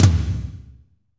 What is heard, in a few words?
TOM, Kit Wet; Northstar - Drumscapes Roland